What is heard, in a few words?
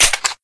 lr300 mag out